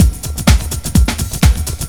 LOOP; SoundFactory; DRUMLOOP

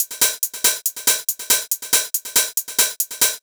Hi-Hat Loop, Drums, Dance Beats

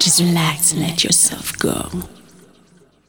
vocals; SoundFactory; SMPMANIA

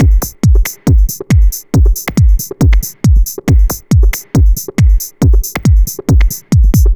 Dance Drumloop; Drums; Dance Beats

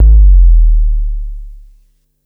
KEROBOOM; pitchshifter PSI

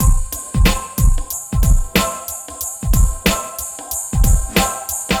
Old School Break, Drums

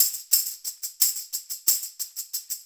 Acoustic Percussion

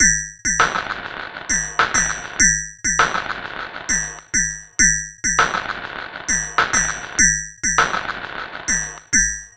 Electronica Drumloop

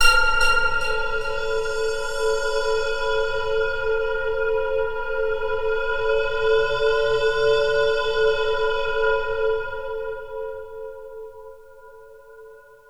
Pad, Synthesizers

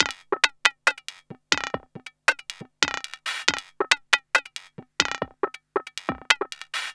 Electronic Percussion

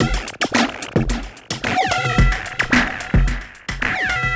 Electronica Drumloop; Drums

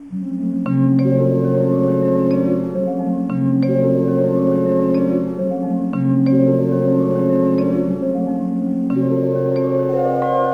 Strings

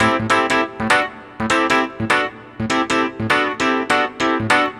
Electric Piano, Loops, Keys

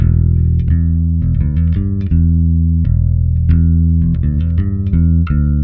Electric Bass; Basses

